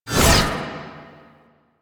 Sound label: Whip Swipe; Weapons; Melee; Warframe; Dagath